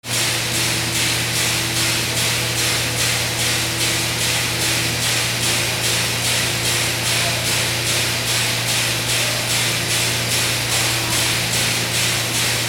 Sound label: INDUSTRY